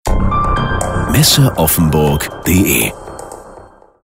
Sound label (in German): Sound Logo; Messe OG; Audio Branding